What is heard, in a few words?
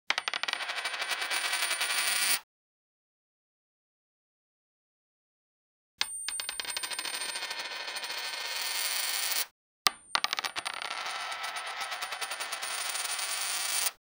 player collecting a coin